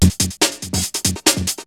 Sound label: BREAKBEATS, TECHNO